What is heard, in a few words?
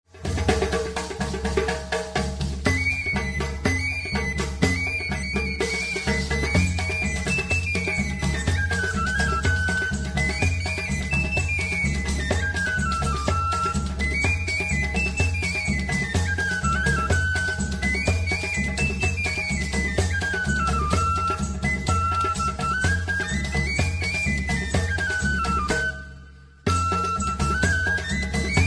pipe and drum